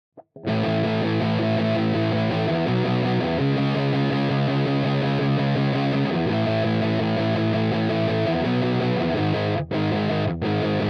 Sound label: Ampeg Sim Test Iso